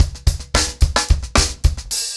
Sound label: Hip Hop 110BPM, acoustic drums drumloops